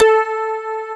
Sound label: SYNTHESIZER DIGI DECAY